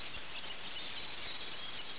BIRDS

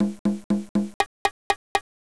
Drum Song; Mythos

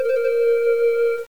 lightning; RuneWar

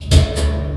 attach; Ballist

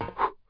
ladder; Daikatana

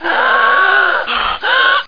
gasp; Hexen2; player

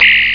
birds

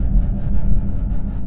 Smelter; machines

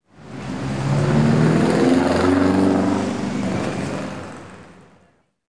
traffic; zones

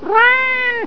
Cat Meow

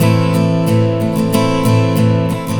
Strummed, Acoustic Guitars; ACID MUSIC

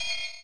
pling; rapanui

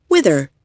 WITHER

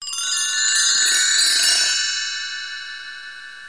floor; McDonaldland - Silly Games; McDonald's; dominoes

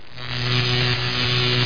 BOLT; landslor

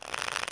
shuffle; MCSolitaire

